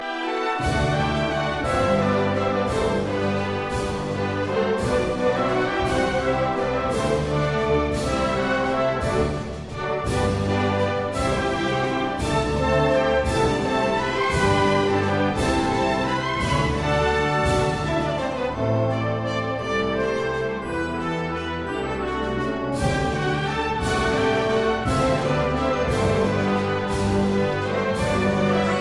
anthem bangladesh

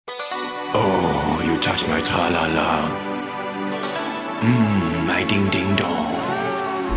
ding ding dong

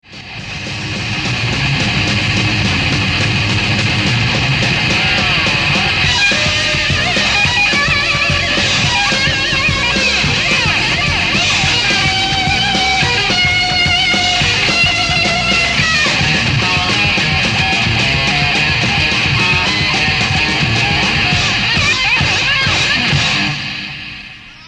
heavy metal

